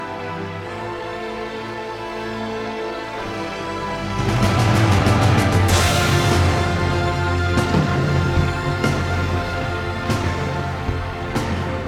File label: cinematic, cinematic orchestra with epic drums; suno